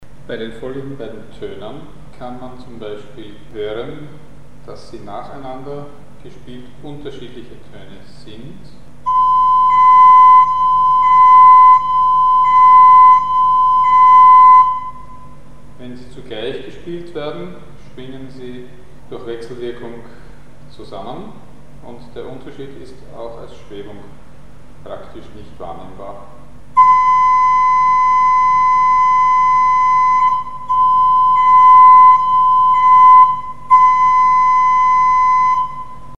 Unterscheiden zwischen sehr aehnlichen Mikrotonfrequenzen; Mikrobuch

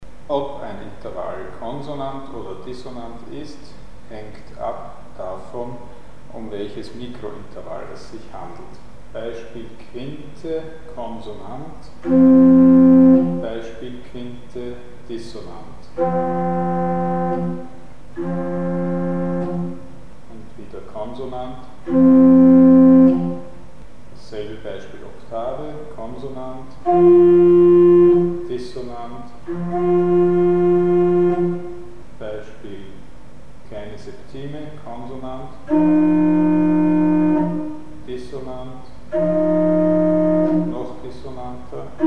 Mikrointervall bestimmt den Konsonanzgrad des Intervalls; Mikrobuch

